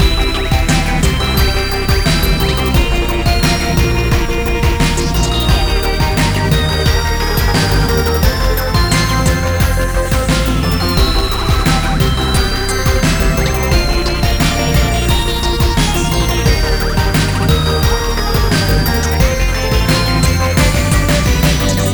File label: Asteroids Inside Fast; rive wreck hack die retry; ps4